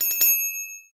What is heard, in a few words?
Restart Flag Timer Increase